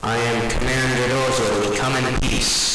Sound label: radio